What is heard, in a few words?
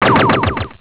bweep; robot b9